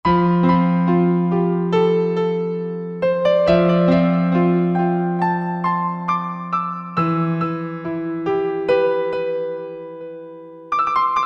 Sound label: Echo Piano, Roland JV-2080